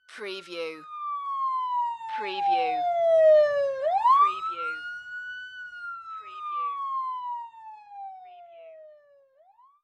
Police Car Siren, Pass By

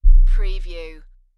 LFE Hit

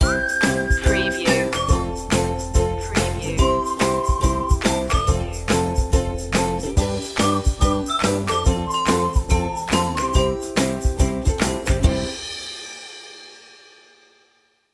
jolly ukulele; positive music

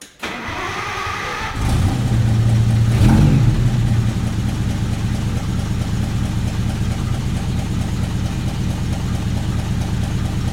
Diesel Engine

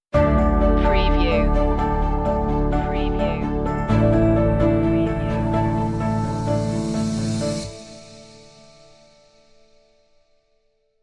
how far can we go stinger

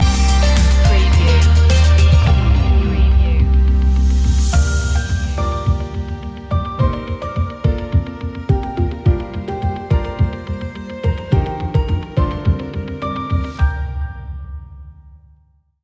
the feeling you love no vocals

